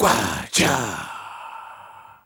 Male Voice, African Voices

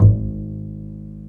PITZ STRINGS

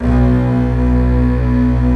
LO ST STRING

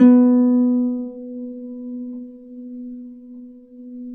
B3 HARP; USB Soundscan vol.62; AKAI

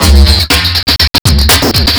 bong choke, breakcore

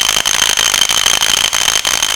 electro perc, E-MU Formula 4000, analog; E-MU Formula 4000 Series Vol. 3 – Analog Odyssey

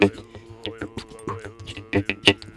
KHOMUS; USB Soundscan vol.60 - Asian Traditions; AKAI

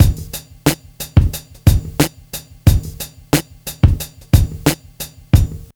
BEAT, Hip Hop

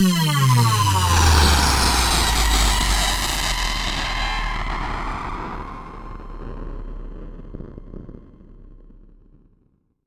Sweeps Down

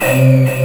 SFX, FILM SCORE; AKAI S6000 CD-ROM - Volume 1

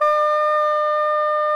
tarogato D#4; SoundBytes - Early Patches

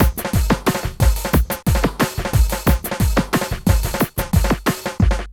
PHATT BEA

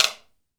guiro; AKAI S6000 CD-ROM - Volume 5

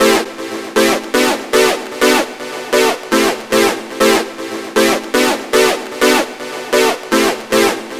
Deep House Chord; Sonic Foundry (Sony Creative Software); Afterhours EDM; Retro Active

